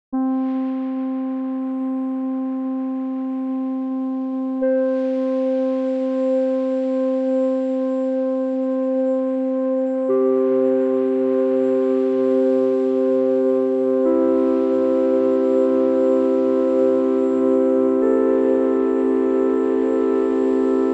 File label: stems